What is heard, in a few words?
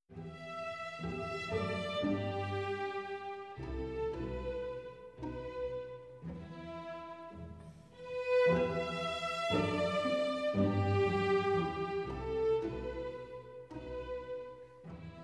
Serenade for Strings; Tchaikovsky; Sondeckis; Arensky Variation